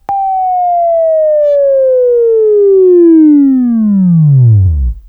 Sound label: Buzz; Labview-Program; Sweeping Training program